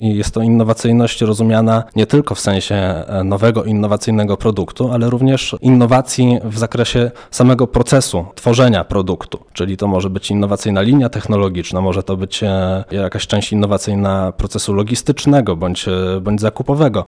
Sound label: techno